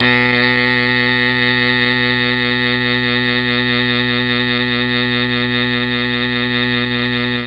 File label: B1 MKII SAX, mellotron